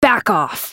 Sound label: spell cast effort, en US; Sivir